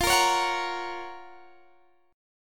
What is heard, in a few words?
Gb Major F; Listen to Gb/F strummed